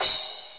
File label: crash